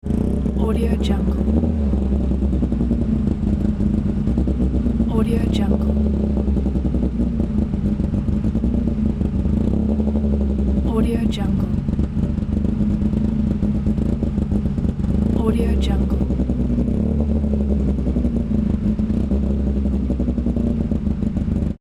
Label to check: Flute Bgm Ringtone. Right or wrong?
Wrong